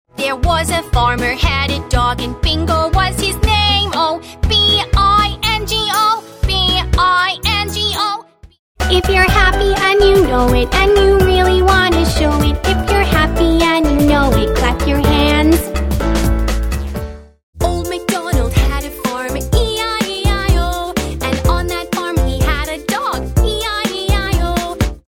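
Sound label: American, songs; US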